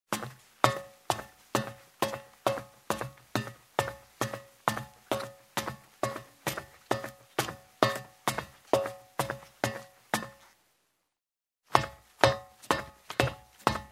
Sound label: Steps on a metal ladder in shoes